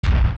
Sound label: Dodongo Step; zelda; OOT